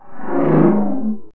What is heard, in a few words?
door